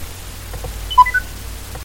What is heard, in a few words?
Talkie switch